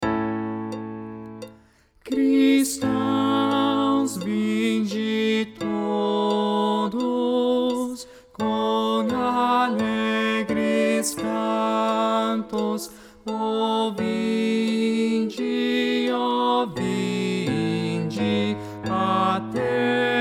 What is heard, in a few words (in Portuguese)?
tenor